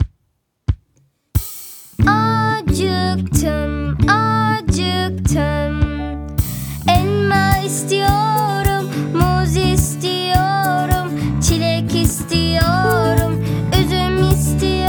song turkish

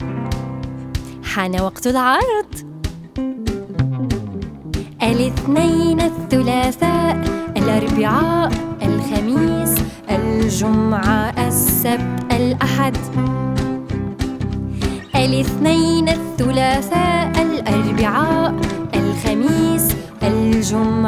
song arabic

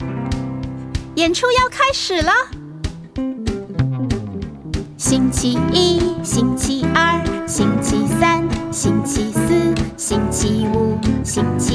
song chinese

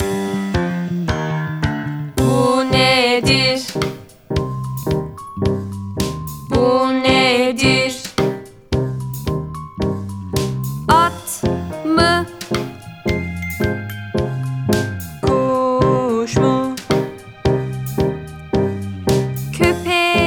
song turkish

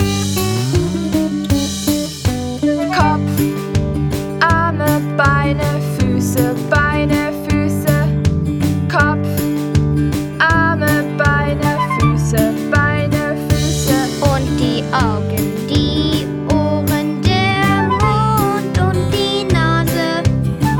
song german

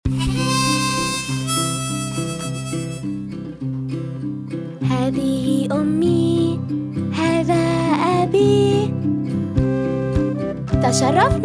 song arabic

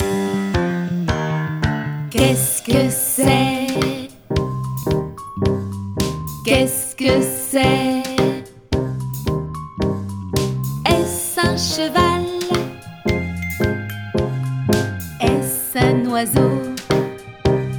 song french

